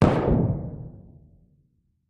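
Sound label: 2 Oz Black Powder Bomb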